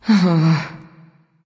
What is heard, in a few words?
nv sigh; smite; awilix